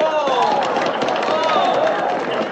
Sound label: cheering audience